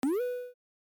jump sound; PPdastr Studio

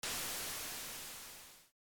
sand sample; PPdastr Studio

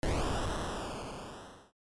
portal sample; PPdastr Studio

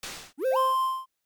achivement; PPdastr Studio

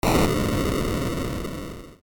stone wall break; PPdastr Studio

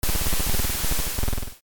ice fading; PPdastr Studio

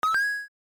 coin claim; PPdastr Studio

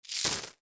buy; PPdastr Studio

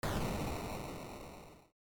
death sample; PPdastr Studio

